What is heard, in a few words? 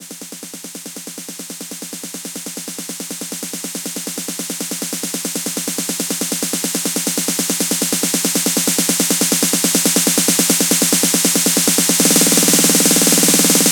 Drum Fill In